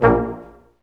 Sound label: BRS, Staccatos, Ensembl stac; Roland L-CD702